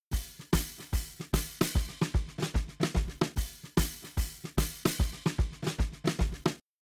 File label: Drums Shuffle SN OHH; WDR2